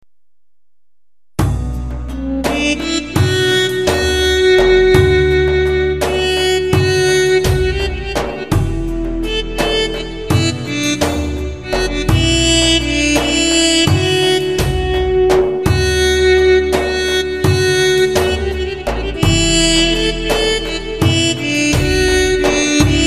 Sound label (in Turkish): yemen turkusu; roland gw-8 mey; mey deneme